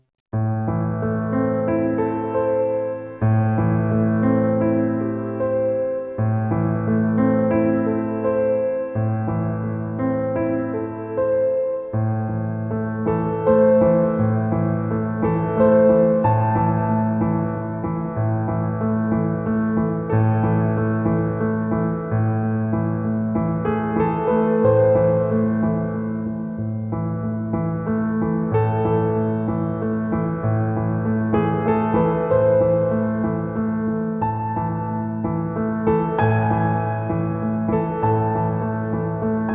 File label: piano elegant; gmod